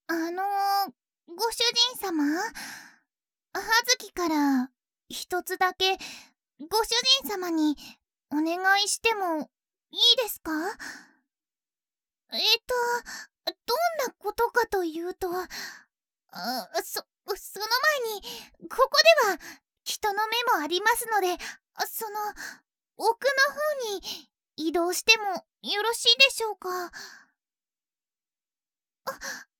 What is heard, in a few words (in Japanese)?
ディープ 指舐め